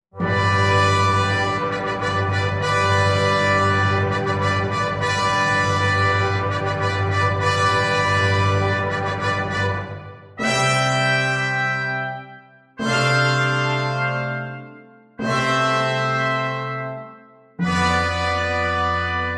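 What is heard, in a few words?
Fanfare Brass Quintet with Timpani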